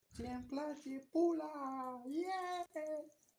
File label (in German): klaps